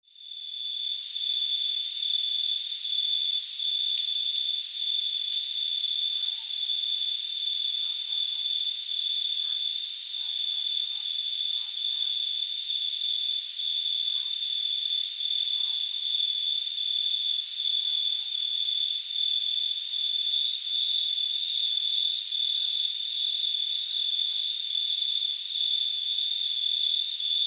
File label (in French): Grillons, Imzzoudar